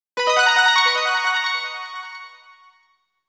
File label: Coin; GM Zero G Zero Starizer